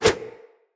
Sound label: Flip Whoosh; Characters